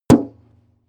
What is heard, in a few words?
metal impact bright muffled